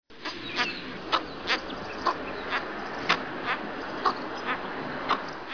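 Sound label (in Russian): egipetskaya caplya golos, cattle egret voice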